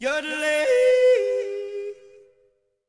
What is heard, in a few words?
yodel tap; sports; murderhorn